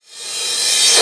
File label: CRASH, REVERSE; Drum Hits